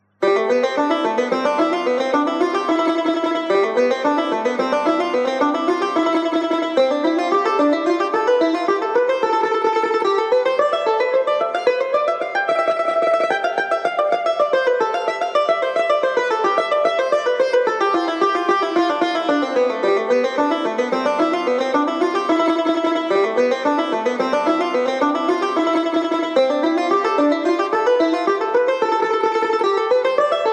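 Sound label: studies for mezrab e chap, Tar; first book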